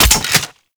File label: Pump Shotgun Mech C; Weapons; Warframe; Zariman